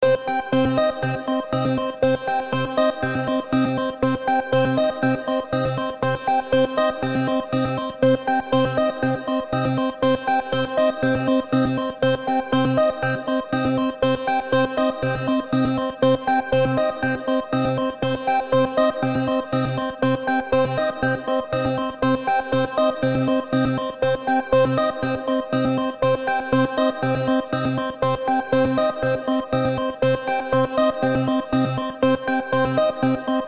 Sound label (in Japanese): ニュース メディア; ニュース メディア ドキュメント